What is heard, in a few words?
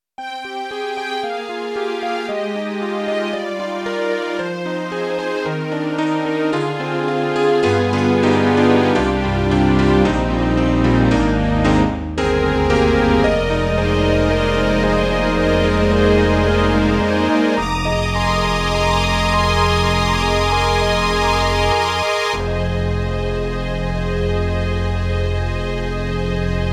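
Roland SonicCell Rapsody, piano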